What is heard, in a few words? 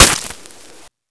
acid hit; flygare